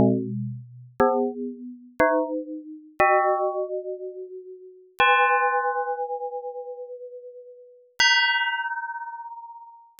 BELL

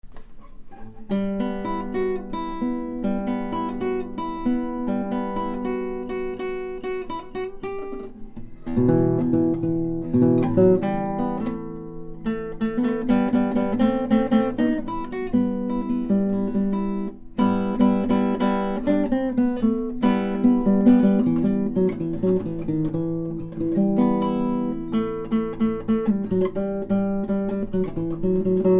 guitar

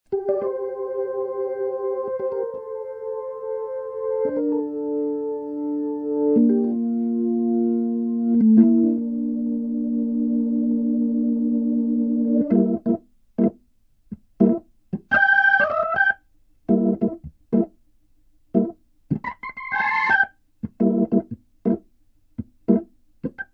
hammond

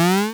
zombie; spelunky 1 1

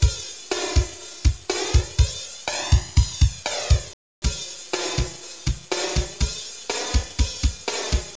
flanger

